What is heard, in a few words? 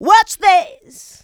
VOCA, Houseworx; Ueberschall; Workz-5